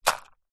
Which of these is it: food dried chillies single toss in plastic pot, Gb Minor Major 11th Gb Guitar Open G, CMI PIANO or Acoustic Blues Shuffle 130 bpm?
food dried chillies single toss in plastic pot